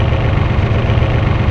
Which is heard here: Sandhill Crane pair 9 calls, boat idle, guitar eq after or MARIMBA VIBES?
boat idle